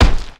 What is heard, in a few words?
dung ball bounce; Hollow Knight